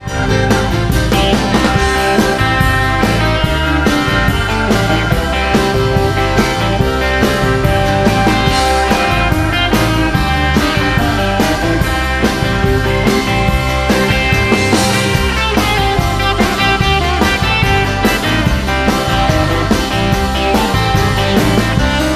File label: Fender tele 1954; FENDER TELECASTER BLONDE 1954; Live - Los Angeles 2006